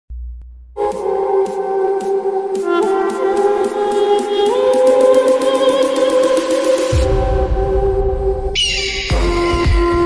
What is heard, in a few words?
eagle only tune; eagle attitude king; boy motivional